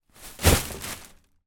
foley plastic bag full clothes drop onto other and box